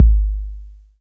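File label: bass drum 808 Tape Decay D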